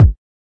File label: kik